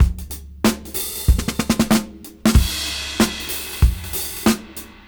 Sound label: Funk 94 BPM; AMG - Keith LeBlanc Phat Butt Jams